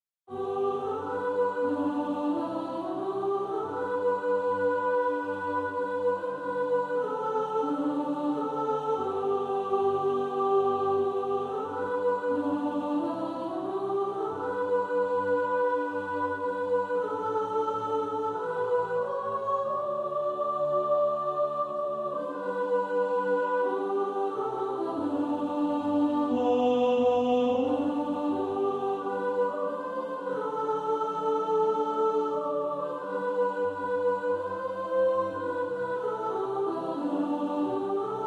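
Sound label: soprano, practice music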